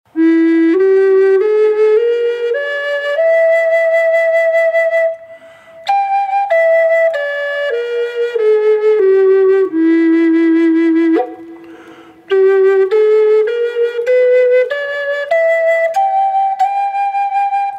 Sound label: Em wet, flute